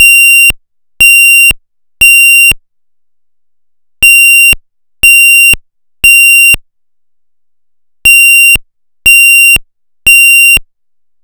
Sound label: Interrupted ISO8201 Sweeping